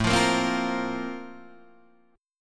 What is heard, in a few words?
F Suspended 4th A; Fsus/A strummed; JGuitar